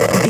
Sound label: radio walkie talkie static